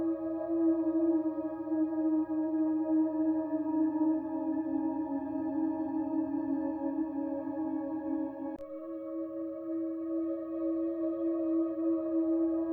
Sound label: Round One Loop, Music, Player Instrument; Arbinn Hills; Deora